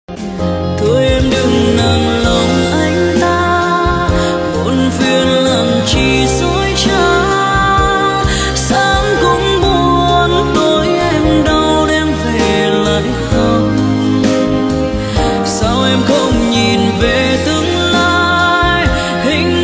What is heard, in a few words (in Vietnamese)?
Nhac chuong giong nu; tiktok